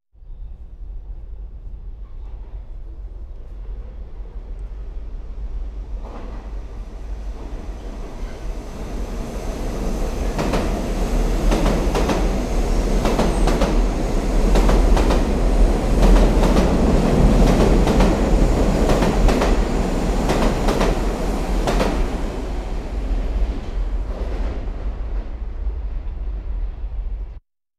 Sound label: Train Passing; KMP Film Video Sound Effects